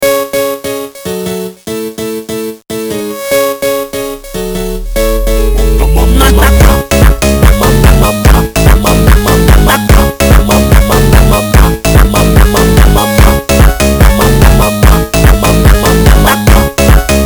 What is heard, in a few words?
Nightcore